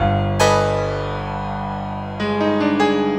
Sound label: Piano Dark, Drum N Bass, Keys Pads; Sonic Foundry (Sony Creative Software); Knutrix Drum & Bass